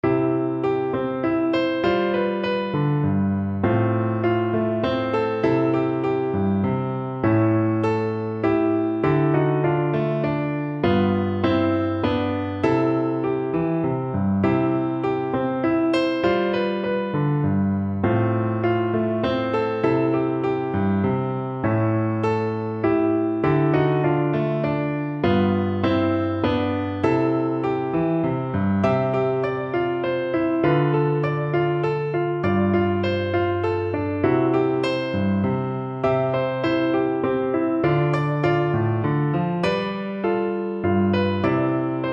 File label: santa lucia, piano